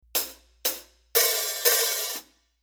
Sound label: Funk HH, Rhythm